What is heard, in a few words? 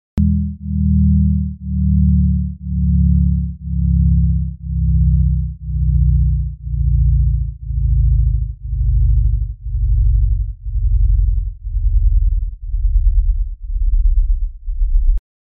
Bass Sweep